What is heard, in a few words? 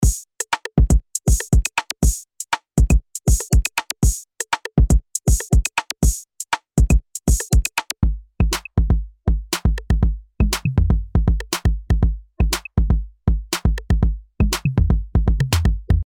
Drumloop Softube Console MK3